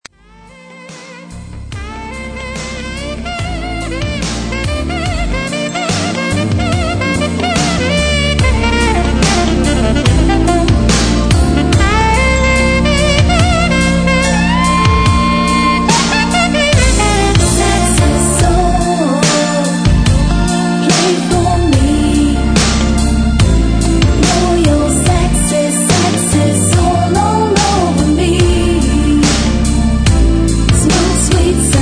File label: saxy fade